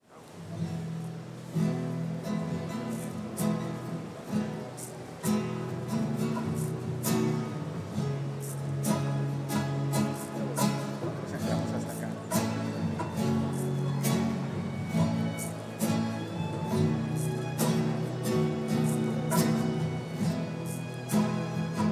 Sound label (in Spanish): canto a don bosco voces